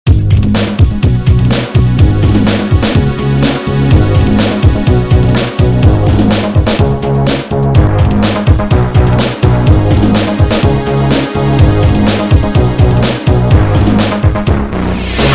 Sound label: depressing rock music